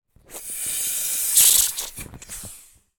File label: toy bop bag inflating by mouth short wet air release saliva